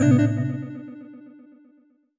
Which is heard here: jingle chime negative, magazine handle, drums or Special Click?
jingle chime negative